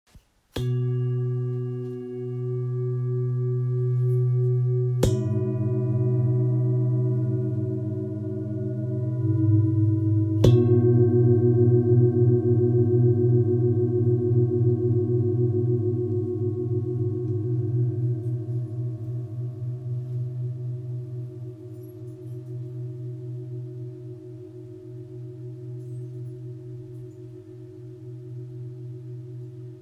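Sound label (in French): Set origine 3 bols graves, bol chantant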